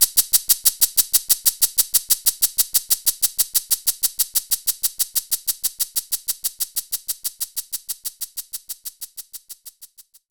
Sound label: Shaker; knights try